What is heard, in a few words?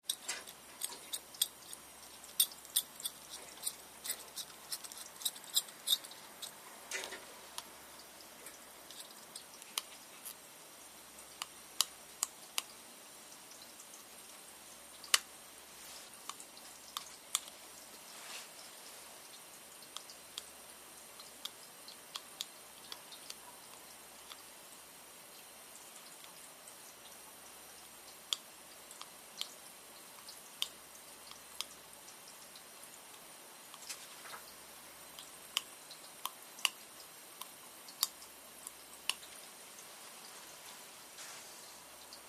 HOSPITAL EQUIPMENT DENTIST: Working on teeth, scraping & picking.